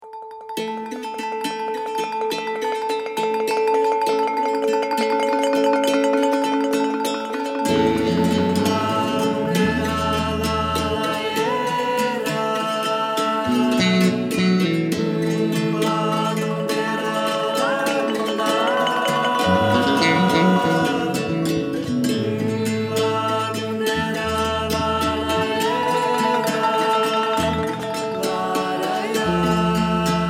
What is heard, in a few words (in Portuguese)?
ritmos africa